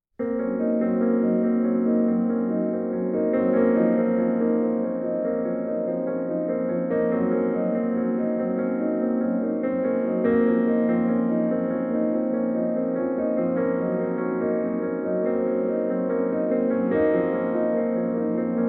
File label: pno only